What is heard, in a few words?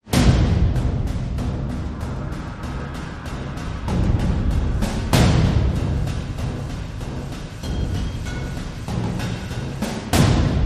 Ominous War Drums